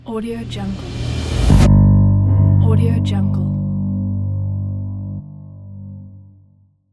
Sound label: bass intro